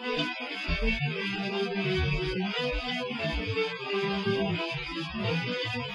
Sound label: Groove